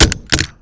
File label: wood; Mafie 1